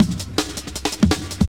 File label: 160bpm, breakbeats